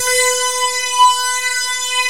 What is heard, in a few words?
ANALOG GIANT; OMI - Universe of Sounds